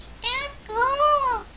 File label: alert